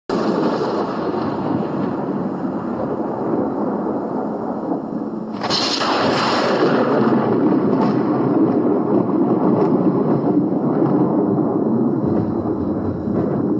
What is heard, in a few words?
thunder